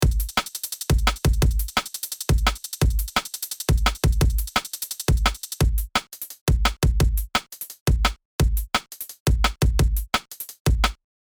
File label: DnB Drums